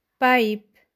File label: pipe; grade3; prathamik upachar